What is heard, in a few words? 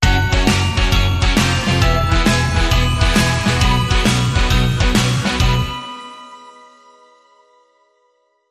Rock n Roll Christmas Sting